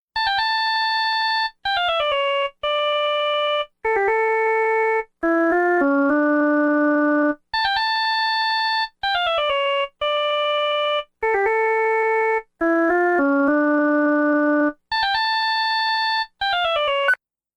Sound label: ringtone, toccata and fugue